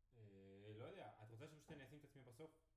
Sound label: Piano